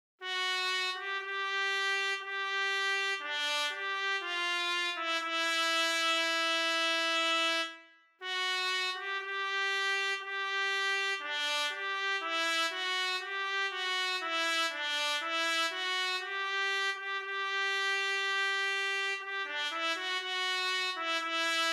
Slow Fox Trompete

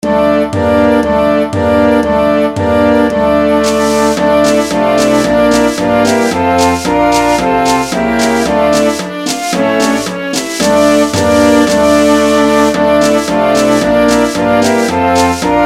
Czardas Horn

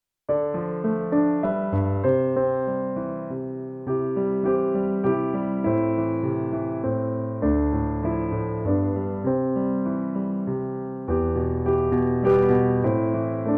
Kleiner Walzer in C

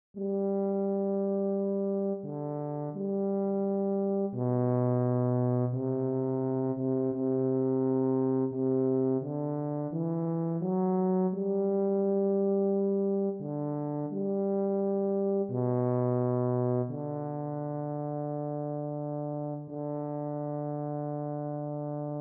Slow Fox Posaune

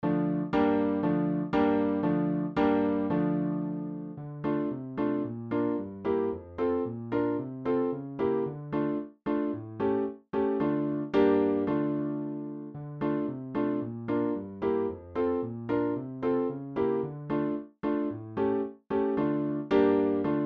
Czardas Klavier

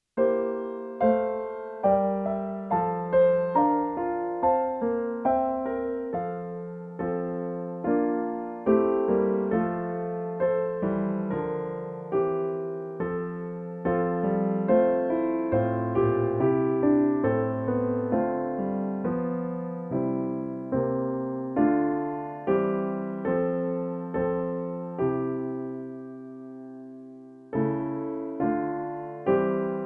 Nun ruhen alle Waelder in C

